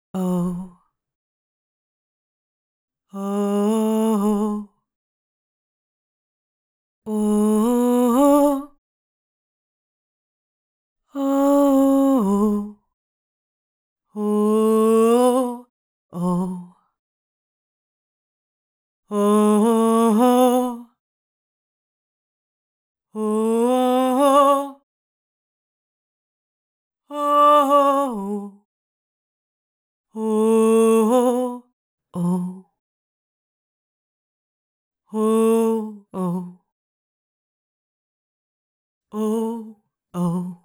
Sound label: Vocals DRY